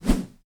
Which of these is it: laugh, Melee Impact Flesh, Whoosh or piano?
Whoosh